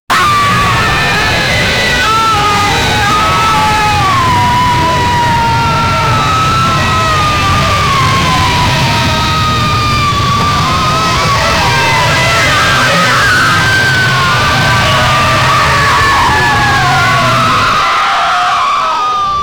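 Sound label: man screaming then falling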